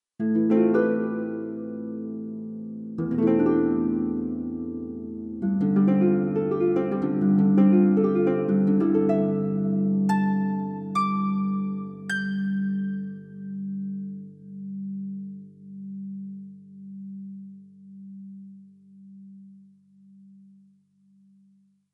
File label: instruments plucked acoustic celtic harp